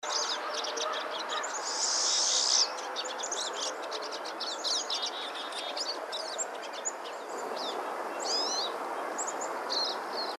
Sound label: SISKIN PINE; species/birds; wbba